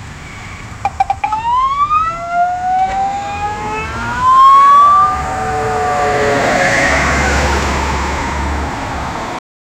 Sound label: carchase, police; carchase between one spo